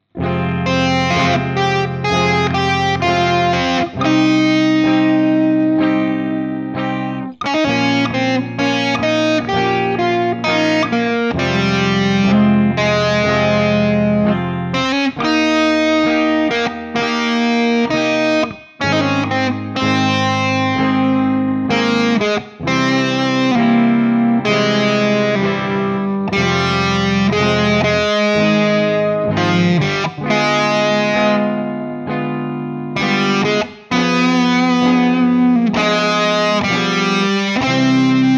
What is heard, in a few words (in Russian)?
Играем на гитаре